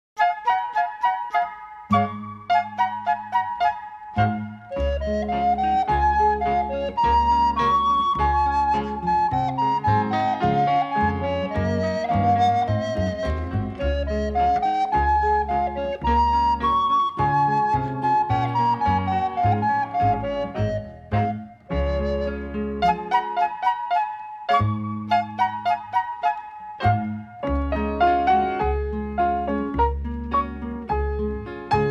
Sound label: Instrumental